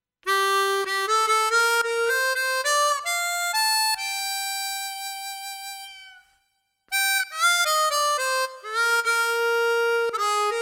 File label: Accordion